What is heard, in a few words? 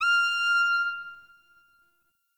Hip Hop Pack; Warframe; Bard; Bard Instrument Packs